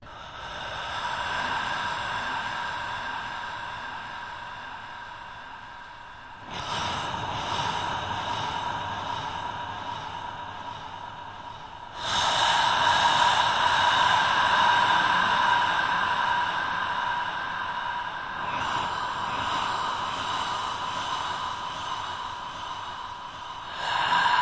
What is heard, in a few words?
breathing in space, Atmospherics